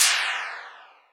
CLAP, EMU Orbit-3 drum machine; 300 Drum Machines

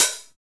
HH, Dance Kit, Roland

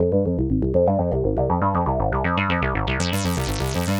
SH101 Arp (120BPM), retro house

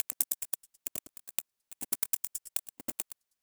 perc 140 flitter, Percussive Loops 140 bpm

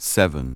VOX GENERAL; Megabass Remix! 2

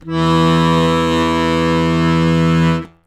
ACCORD BASS E 1

